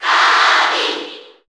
Grito del público Kirby (Japones); SSBU

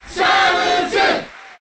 Grito del público Samus; JAP; SSBM

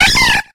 Grito de Hoppip

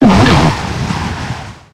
Grito de Tornadus

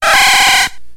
Grito de Flaaffy

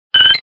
Grito de Swablu

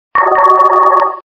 Grito de Gorebyss

